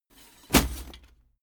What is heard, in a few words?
body drop chain leather short